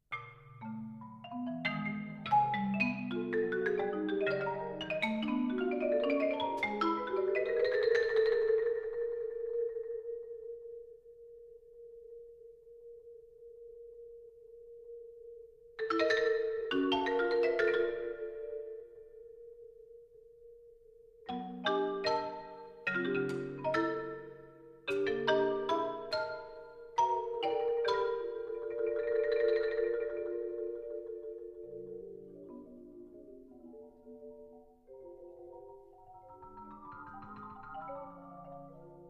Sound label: Duo Marimbas